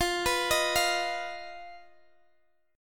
Eb Suspended 2nd Sharp 5th, F

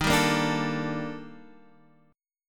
Eb Major Sharp 11th, down, Guitar, Drop D

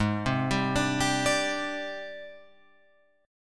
G sharp Major 7th Flat 5th Guitar Open F; 8,7,7,9,10,9; JGuitar

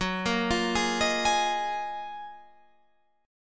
F sharp Major 13th F sharp; F#M13 Chord; arpeggiated; 14,13,15,13,16,16

